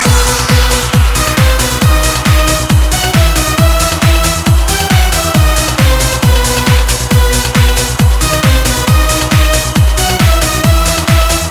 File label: Hava nagila 8 bit